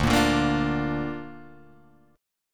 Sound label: D Minor Sharp 5th D Guitar Open G